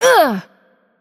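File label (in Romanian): Attack Heavy, Romanian; VO; bloom minigame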